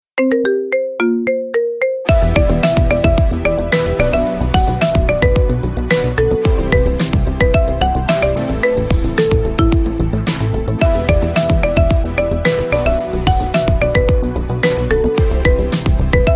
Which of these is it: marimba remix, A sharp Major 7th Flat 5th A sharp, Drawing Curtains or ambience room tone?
marimba remix